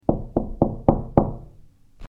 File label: Knocking on door